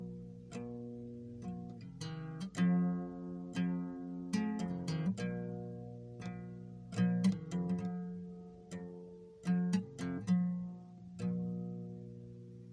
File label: guitar ringtone